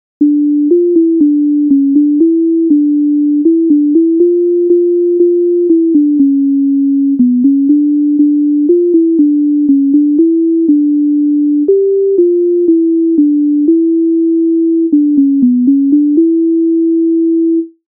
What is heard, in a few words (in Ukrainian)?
Концерт №3 для фортеріано з оркестром - головна тема І частини, MIDI файл завантажено в тональності d-moll; Rakhmaninov; Kontsert 3 dlia forteriano z orkestrom